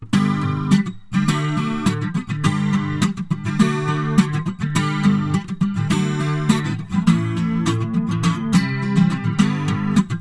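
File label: Acoustic comp chorus eq reverb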